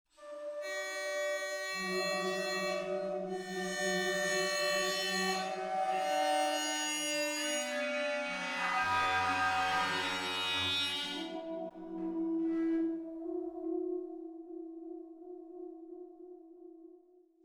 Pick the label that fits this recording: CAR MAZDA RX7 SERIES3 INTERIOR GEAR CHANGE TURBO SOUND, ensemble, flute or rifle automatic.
ensemble